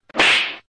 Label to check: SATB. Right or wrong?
Wrong